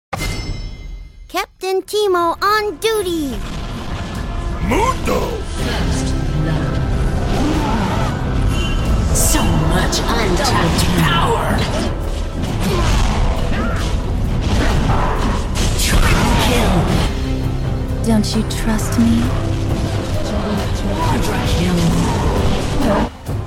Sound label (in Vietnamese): Nhac chuong; Lien Minh Huyen Thoai